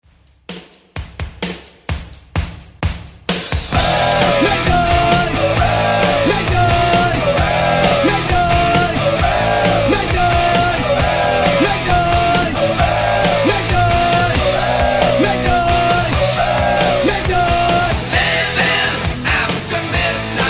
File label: Rock Hype Re Drum 129Bpm